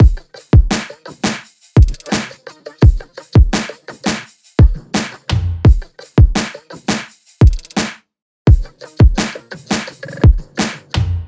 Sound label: loop full 85; Seismic Loops